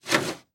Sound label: Foley Tray Lunch Tray Slides Quick